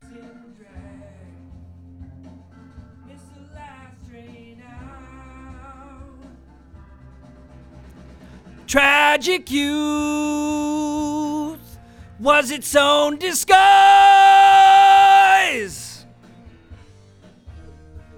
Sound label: VOX